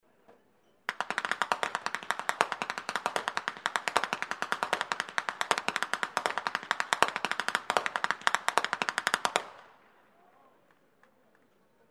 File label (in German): schnalzen; rupertigau